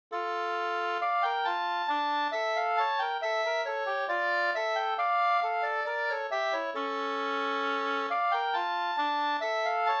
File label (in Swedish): skanklat; Rattviks skanklat; Hook Olle